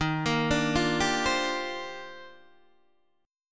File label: D sharp Major 13th D sharp Guitar DADGAD, chord